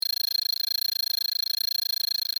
Scanner Pulsing Dull, drones

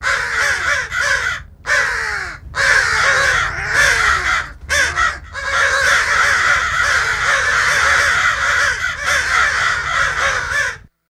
Crows Cawing Angrily

